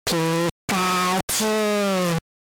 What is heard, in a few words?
Pikachu SFX; RBY